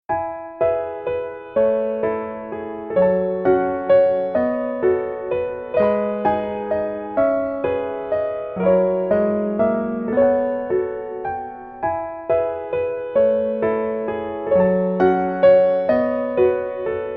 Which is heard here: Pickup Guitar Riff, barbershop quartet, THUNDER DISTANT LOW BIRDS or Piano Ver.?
Piano Ver.